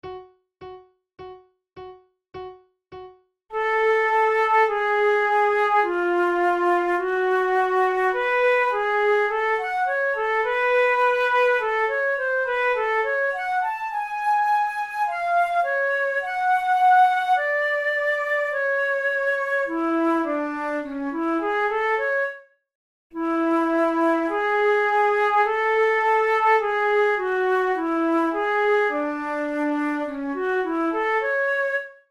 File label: duet in f sharp minor; kohler op93 no1 duet no10